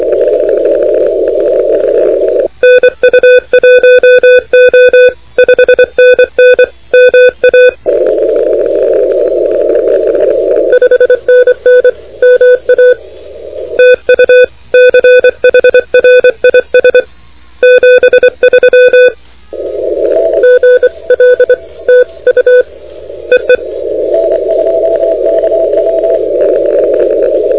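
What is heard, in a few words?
continuous wave 28MHz; ARRL10M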